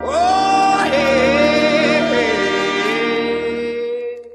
woah yeah, SUNG; ooh ahh

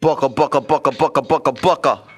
booka booka booka booka booka booka booka, Voice; ooh ahh

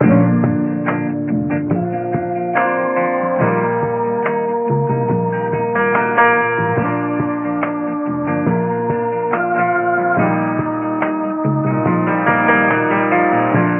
keys, phonky loops

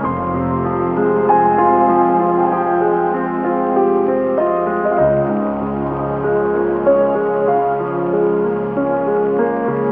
piano, sad

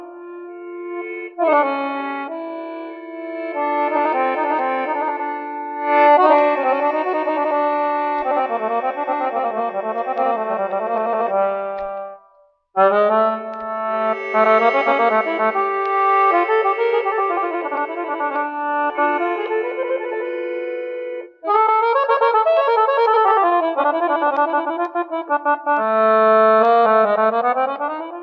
keys, phonky loops

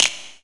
Snares, Clap n Snap

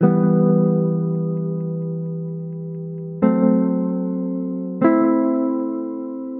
keys, sad loops

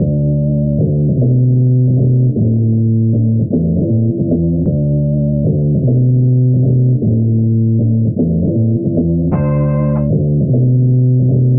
bass, Loops

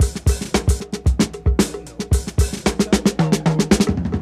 got de funk, drum loops

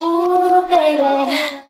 "ooh baby" female SUNG

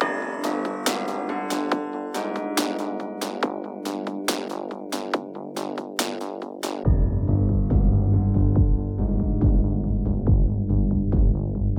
keys wretched, sad loops

